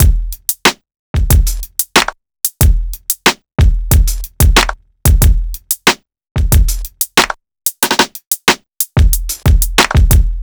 Hip-Hop Drumloop

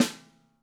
Snare; Drums & Percussion; One Shots Drums

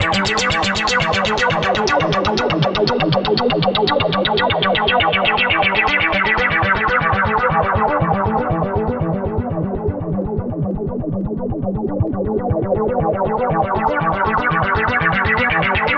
Synth

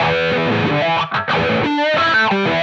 Distorted Guitar

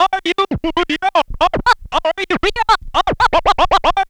Scratching, Vinyl Scratches

